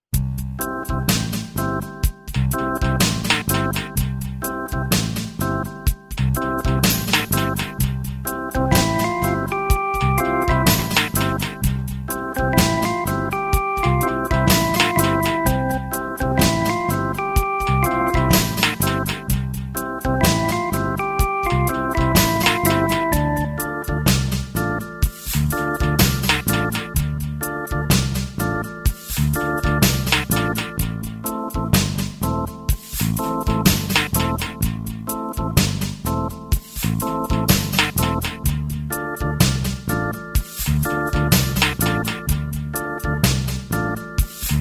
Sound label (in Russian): muzyka dlya instagram